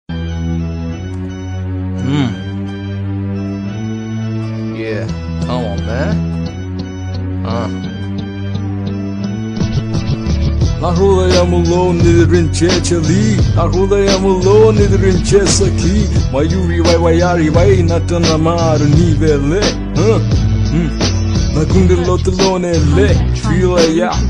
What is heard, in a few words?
telugu rap ringtone